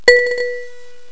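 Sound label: XYLOPHONE NOTE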